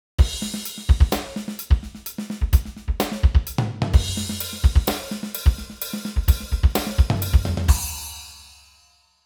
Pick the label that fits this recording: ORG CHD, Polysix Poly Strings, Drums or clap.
Drums